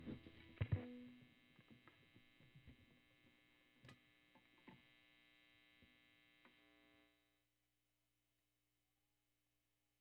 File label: Gtr Solo